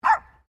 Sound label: Animal Dog Poodle Miniature Bark; Various domestic dogs; Dogs (Sound Effects:Animals)